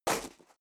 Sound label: step high heels gravel medium single stair down; schritte; Footsteps; menschen